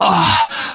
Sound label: pain; genji